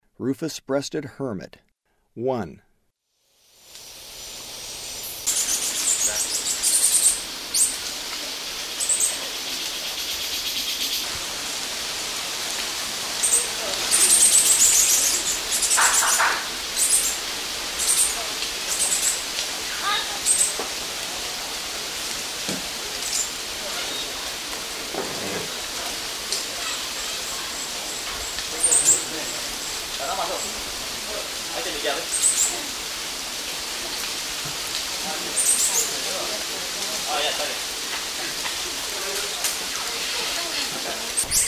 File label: Rufous-breasted Hermit; S. America; Eastern Lowlands